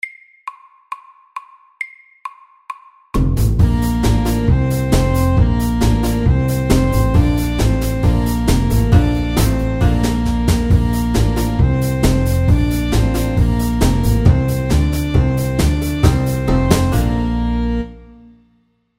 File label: Square dance FAST 135